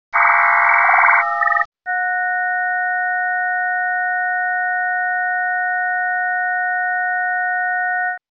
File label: tune; WinDRM; digital mode on HF